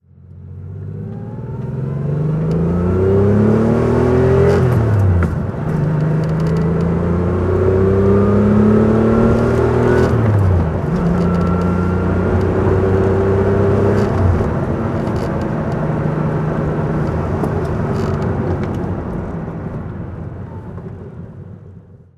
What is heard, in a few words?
porsche 911sc driving